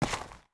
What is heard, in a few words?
FS Dirt, Footsteps